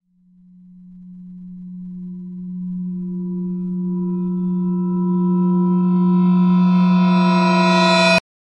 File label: Build Up Scare Horror